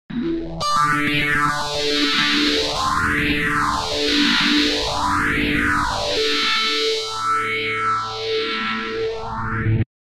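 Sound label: Electric Guitar Rock Arpeggio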